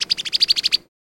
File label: Brown Headed Cowbird